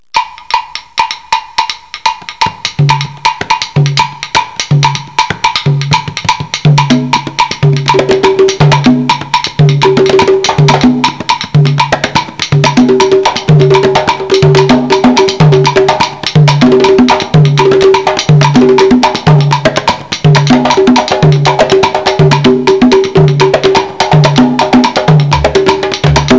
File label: GuaGuanco; Contact Lens Series Volume 1